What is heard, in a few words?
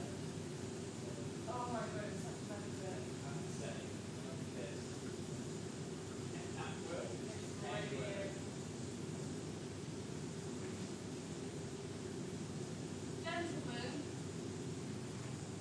spooky underground library murmurs